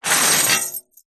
keys large bunch prison guard pick up from metal surface hollow